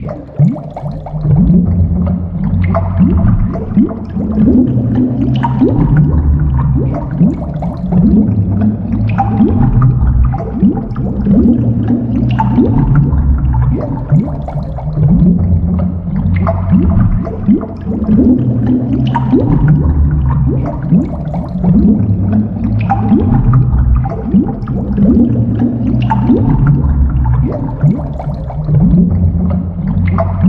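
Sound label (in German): blubber, plätscherndes Wasser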